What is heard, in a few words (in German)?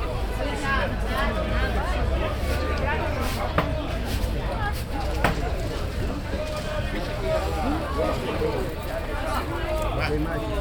aufm markt, Brasilien; Fieldrecordings